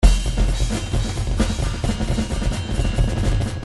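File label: drums jazz solo; Paul Kodish Dangerous Drums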